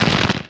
asteroid damage small; Factorio

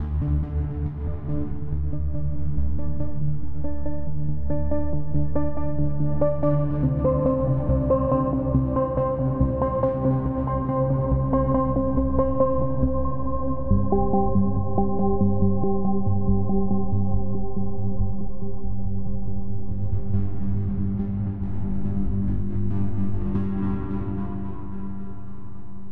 ambient, space, interlude; factorio

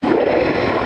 stomper idle, enemies; Factorio

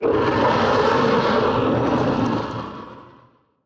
demolisher roar, enemies; Factorio

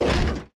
thruster mechanic stop; platform thruster; Factorio

